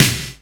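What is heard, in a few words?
SNARE TR 49, DRUM; AKAI S-Series CD-ROM Sound Library VOL-2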